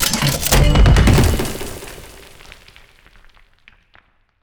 Bust Quest start; Bust n Rush

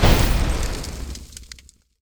Fireball hit; Idle Wizard

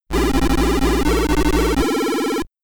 Get Ready! (SID Stereo); Switchblade; Commodore 64

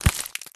Chocolate Removed; Candy Crush Soda

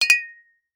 wine bottle full tap wine bottle empty

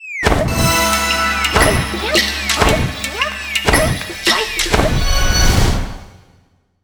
Musical sumo Dice; Yu-Gi-Oh! Master Duel

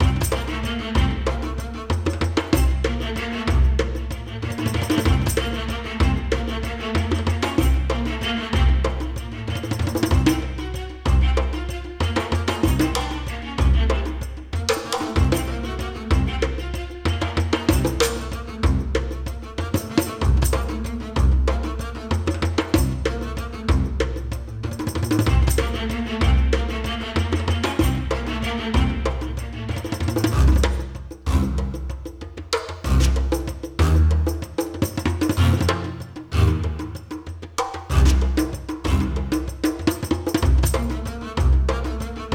Music Frantic Pursuit; Knights & Guns

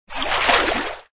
Wade; Extra Lives

